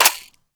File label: Sfx Jammed Weapone; Akxolotl